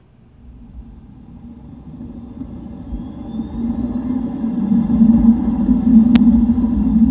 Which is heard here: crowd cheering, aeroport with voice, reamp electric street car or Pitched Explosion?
reamp electric street car